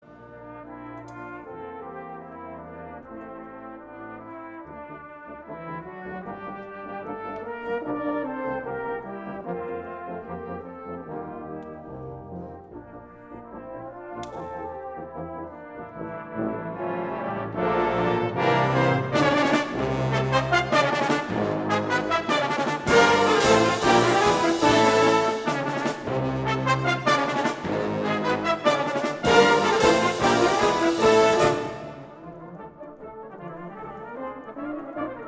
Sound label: toccata